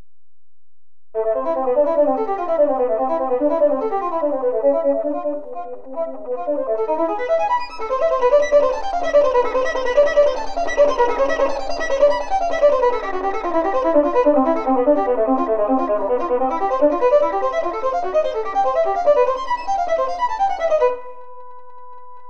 Tremolo varying

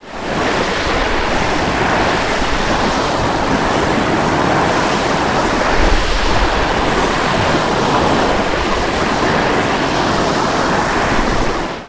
waves synth

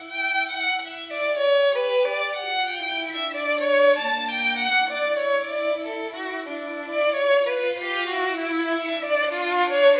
duo synth

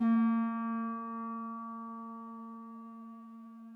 clarinet guitar; interpolator

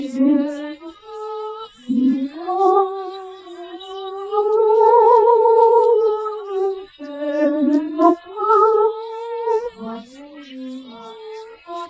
singing

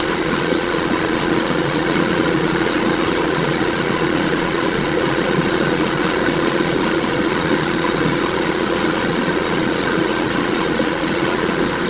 blender

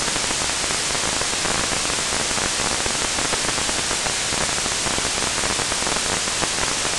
fire

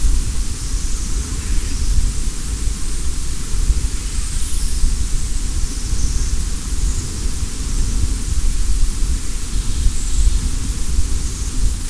wind

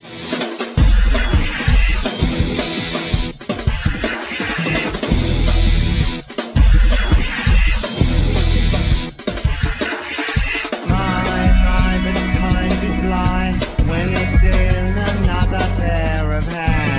Stereo Music; 20kbs; Real

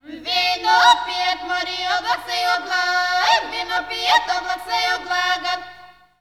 bulg drei

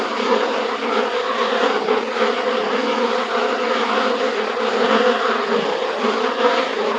bees

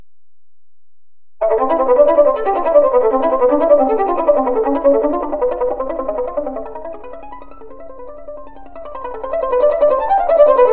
Sautille varying

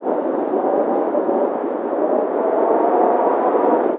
wind

